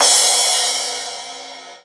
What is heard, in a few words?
Crash, Cymbals; Vengeance Samples